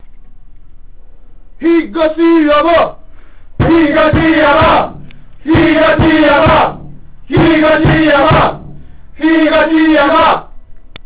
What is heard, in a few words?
call